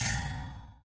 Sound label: mobs oerkki attack; server-nalc; mobs-master-TenPlus1